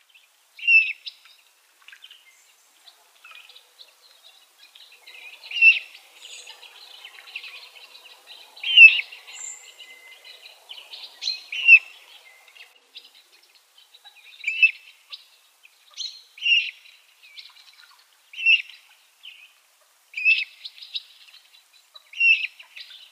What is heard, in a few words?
Common Moorhen downy Young